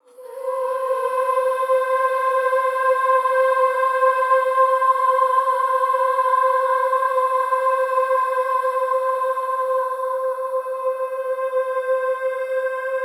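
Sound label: Effects Swarmed, more processed sounds, although still rooted in the underlying vocal sources; vocal samples; Void & Vista; Kontakt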